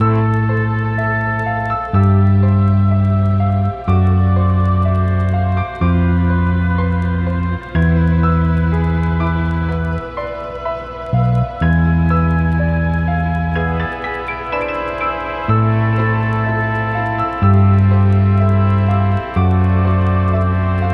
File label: 62bpm